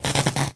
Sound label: Electric; New The Denpa Men